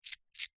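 foley gemstones several small opal amethyst jade handling clutches toss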